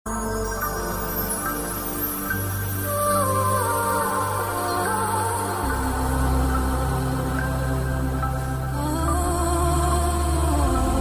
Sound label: sad angel; jazz